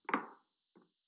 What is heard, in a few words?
footstep wood